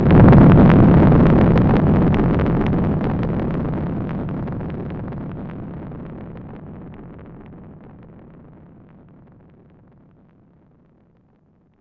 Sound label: Eruption; Roland BK-5